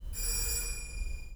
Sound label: door bell